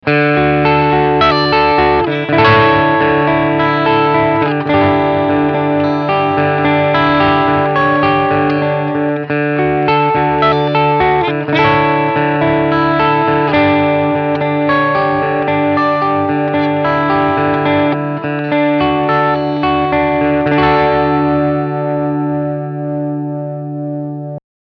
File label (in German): ESP LTD EC-401 clean beide Pickups